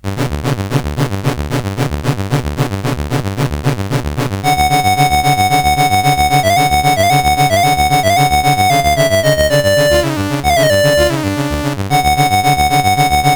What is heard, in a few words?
sabre dance, mus CSG8580R5